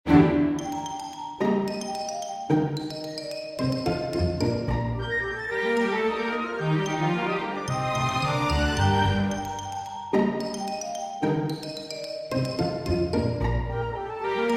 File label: for winds strings; Saint-Saens, Camille; fossils